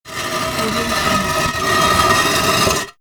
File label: cement drag grind short; electronplanet